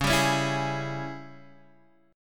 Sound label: G sharp Major 7th Suspended 4th Sharp 5th, C sharp